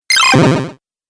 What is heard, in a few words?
Retro Random Bleep Accent; Video Game Sound Effects